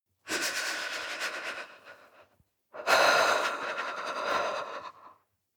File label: breath female shiver